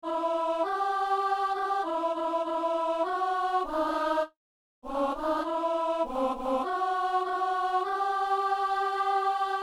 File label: alto